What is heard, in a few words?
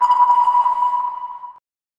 sonar